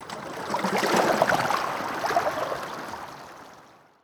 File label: plink intro; economy